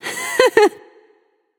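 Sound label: heh heh, female; smite; voicepack; hera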